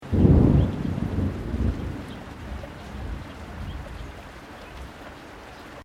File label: THUNDER DISTANT LOW LIGHT RAIN BIRDS; Elemental weather effects; Thunder Sound Effects